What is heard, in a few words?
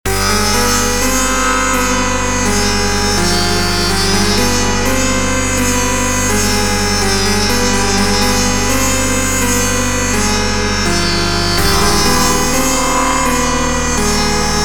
Sitar